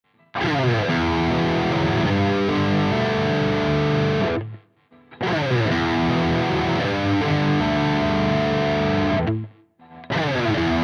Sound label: AxisFlanger lead all on 12 Feedback 5 Settings